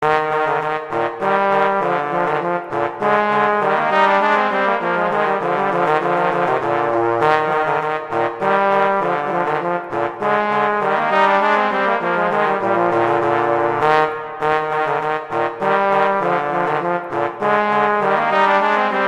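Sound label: Duet, horn; Mozart; Duets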